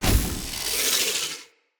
Shield Orb Appear

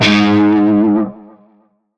Shawzin Flourish Oct One G Sharp; Music; Warframe; Narmer Shawzin

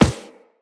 Walk Metal, Footsteps; Operator

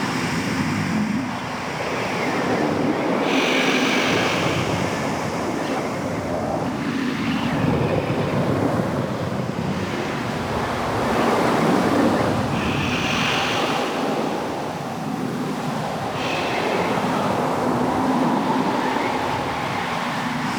Settlement Ravine Exterior Ambience; Warframe; Grineer Settlement

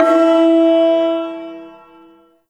Shawzin Oct One E; Warframe; Duviri Ersatz Shawzin

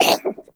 Kitten Cough Hairball; Warframe; Catbrow

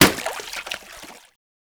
Water Balloon Impact

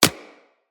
Bullet Impact Generic

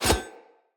Split Sword Whoosh; Weapons; Swords; Starter Split Sword

